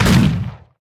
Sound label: heroes broden basic attack impact